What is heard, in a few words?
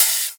Open Hihat, Dubstep; Drums; One Shots; Cymbals; Hihats; Cymatics; Dubstep Starter Pack